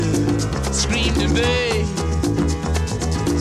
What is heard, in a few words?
music; wu xing; earth